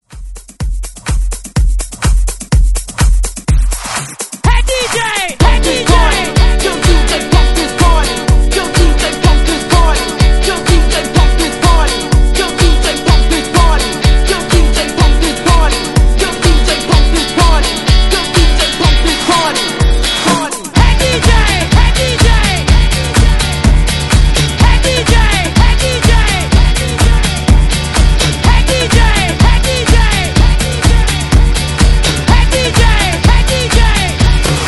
Hype Re Drum 125Bpm

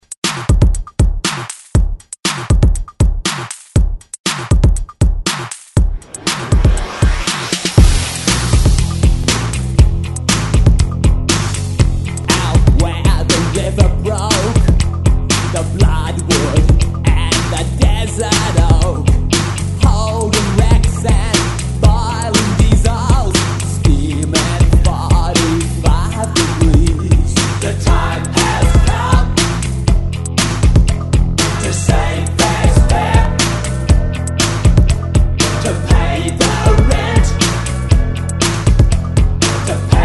Rock Re Drum 120 Bpm; DJDOLLS

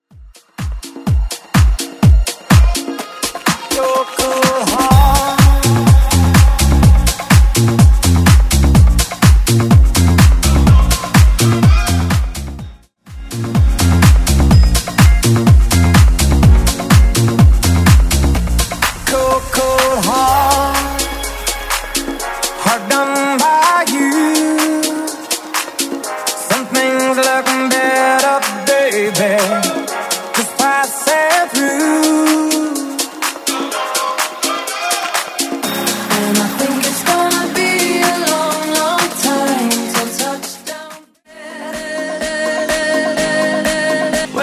House Mixshow

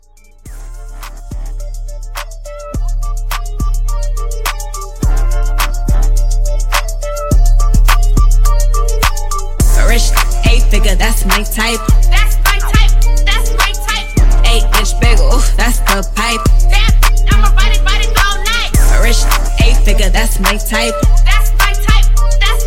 Hype 105

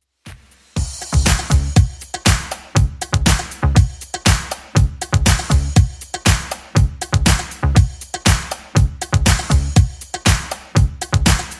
80s Redrum 120 bpm CLEAN

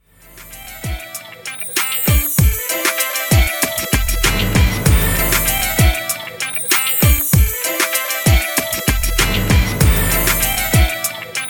Party Redrum 97 Bpm; djyan

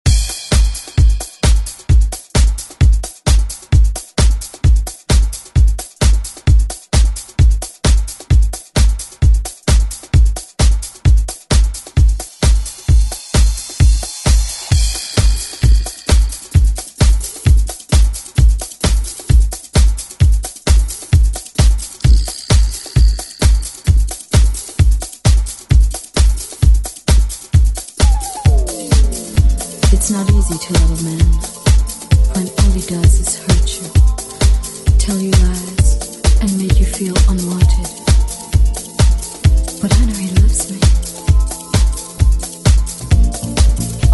80s Re Drum; DJ Mhark

